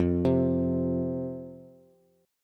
F 5th F, down, Bass; F5 arpeggiated

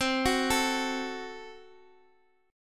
Gb Diminished

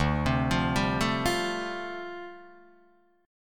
D Minor 11th D down Guitar Open D

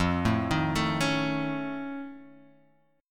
F Augmented 7th, Guitar, Half Step Down

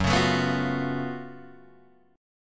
Gb Minor Major 11th Gb down Guitar Open G

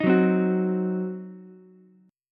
C Diminished C, Ukulele; strummed; JGuitar

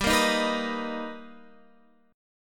B Augmented Major 7th G, chord

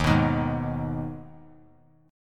D sharp Suspended 4th Sharp 5th; strummed; JGuitar

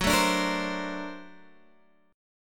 Bb Augmented Major 9th Gb

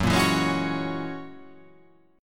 F sharp 9th F sharp, chord

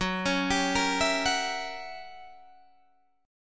Fsharp 7th Flat 9th down Guitar Open D

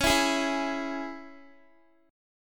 Db Minor, Guitar; strummed

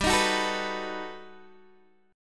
G Augmented Major 9th G down Guitar DADGAD

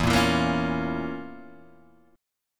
F Major 7th Suspended 4th Sharp 5th

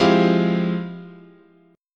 F Minor Major 9th

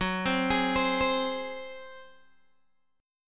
Gb Suspended 2nd Flat 5th Guitar Open D

